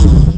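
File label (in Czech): srazka, collision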